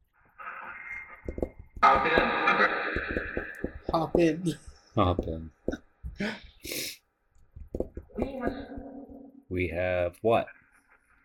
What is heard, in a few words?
Tarot Card Room Phasma Box